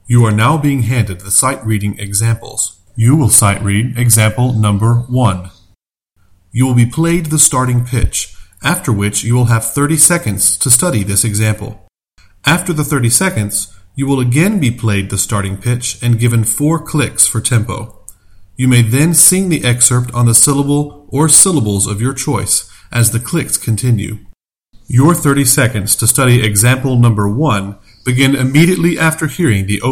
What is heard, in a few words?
Soprano; Mid-State